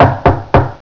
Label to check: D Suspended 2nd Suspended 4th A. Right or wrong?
Wrong